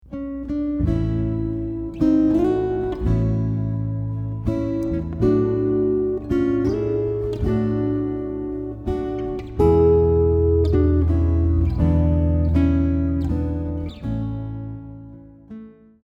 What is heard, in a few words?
Old Rugged Cross arrangement, guitar; hymns and gospel songs on guitar